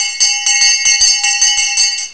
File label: Festival Winner Bell; Ringing the winner's bell at the festival (also used in Cucco-catching game)